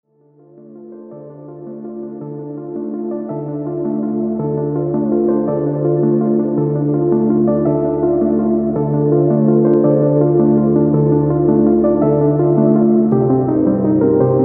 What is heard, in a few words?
soundtracks